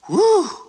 "woo"

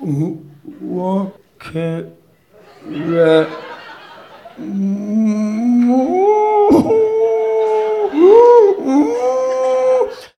confused moans; ooh ahh; human

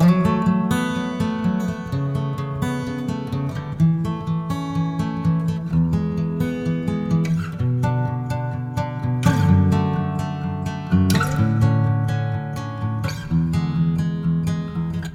guitar, Loops, Sad

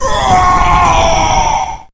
scream

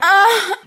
"oh", orgasm; ooh ahh

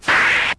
whip

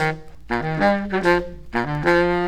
sax, Loops; Phonky

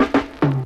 heehaw, drum loops, rolls

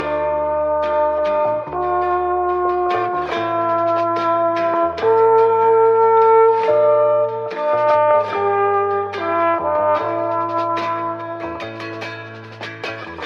horns

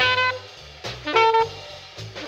sax beeboppin, loops, phonky

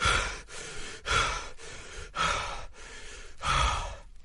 breathing heavy; ooh ahh

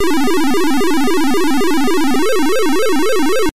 tractor beep; Zaps and Beeps

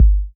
kick, Heartbeats

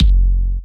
kick, Heartbeats

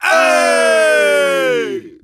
ay group of men, voice ooh ahh

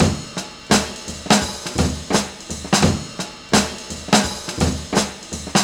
jazzy raps, funky drum loops

